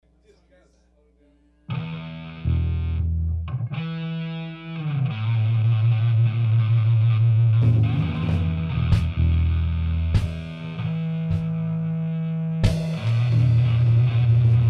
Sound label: Jam; live gigs